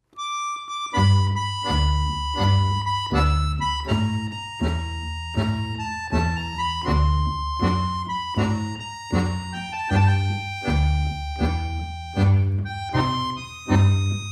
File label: Moaning